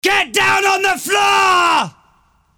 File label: Timer Alert